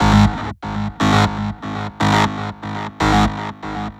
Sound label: Synth